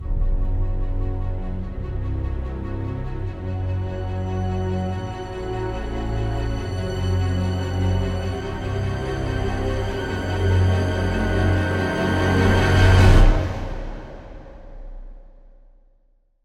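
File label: Combat Medium Threat Intro Long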